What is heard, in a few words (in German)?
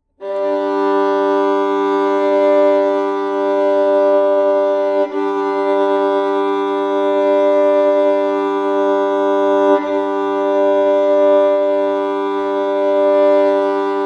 geige g d doppelsaite stimmen